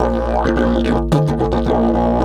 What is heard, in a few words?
108, DIDGE 108BPM; Big Fish Audio - Didgeridoo and Other Primitive Instruments